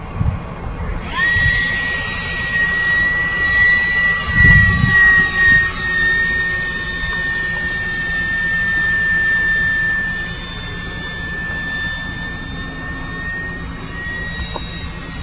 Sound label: f-16 takeoff